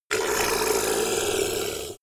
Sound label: ogre grunt short